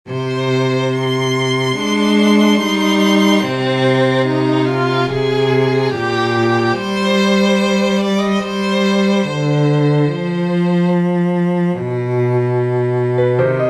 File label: piano idea with violin solo